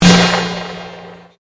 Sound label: Convolution Pulse